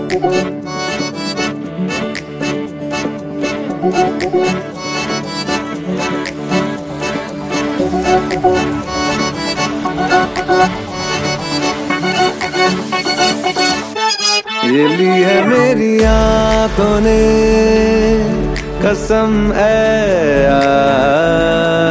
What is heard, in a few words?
ringtone bollywood ringtones